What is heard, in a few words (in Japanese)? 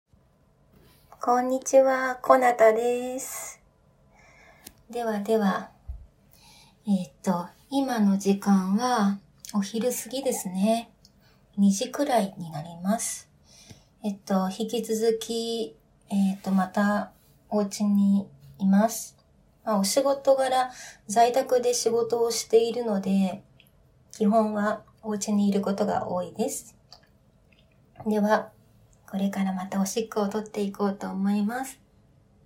おしっこ実演 トイレでおしっこおならを添えて; 放尿